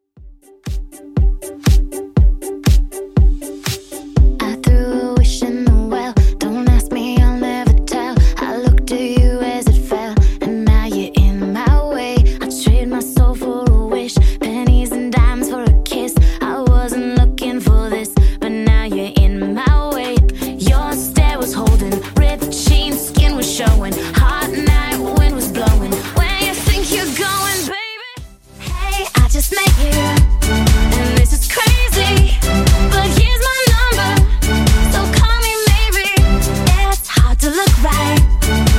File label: 2ks Redrum 120bpm